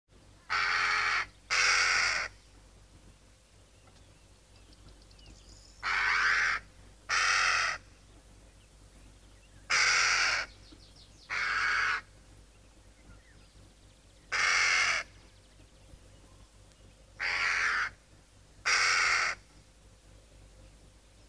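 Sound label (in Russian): крики двух ворон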